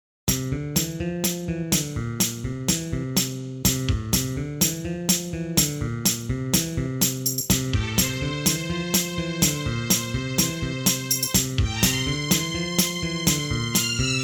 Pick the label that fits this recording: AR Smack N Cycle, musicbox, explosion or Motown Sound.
Motown Sound